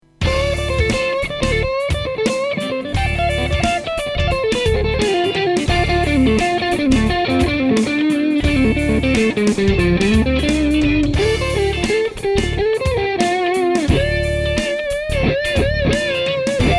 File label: Biyang JRC4558 OD-8